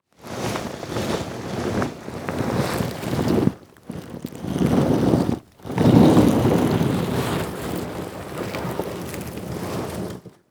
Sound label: Impact Body Drag Through Dirt And Rocks Long